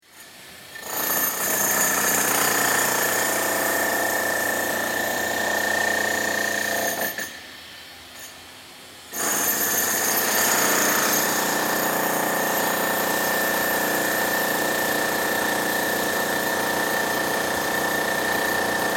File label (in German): Schlaghammer